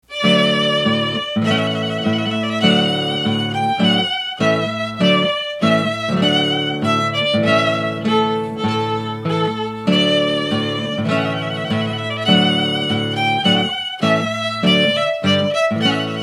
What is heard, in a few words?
Gtr Vln Trumpet